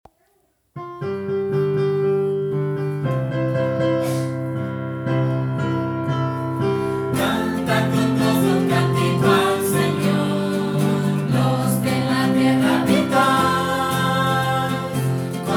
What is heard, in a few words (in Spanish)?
guitarra y piano